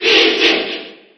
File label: Cheer JP; Peach; SSB4